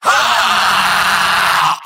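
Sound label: medic pain critical death; vo; tf2; mvm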